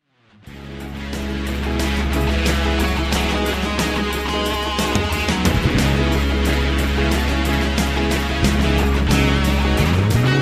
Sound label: Elec Guitar